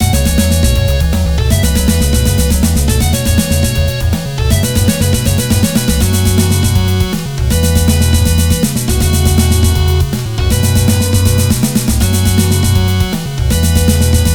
Victory! Victory! Victory!, featuring that cheesy 80's arp